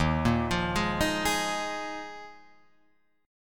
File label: Eb Suspended 2nd Suspended 4th, Guitar, Open F; Ebsus2sus4 arpeggiated; JGuitar